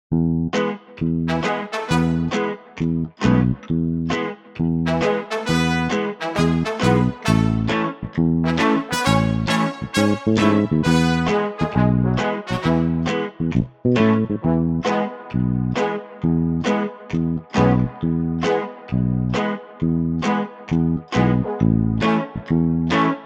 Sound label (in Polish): Poranny swing instrumental